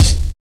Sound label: treble rich kick sound d key, kick one shot tuned to the D note